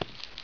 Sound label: run